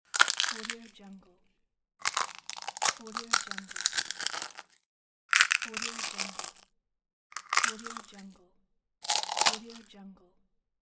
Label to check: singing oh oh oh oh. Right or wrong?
Wrong